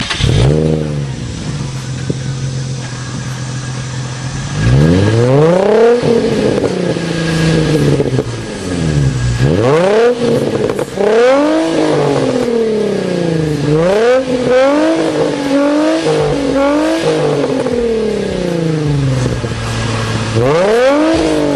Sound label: 350z Sound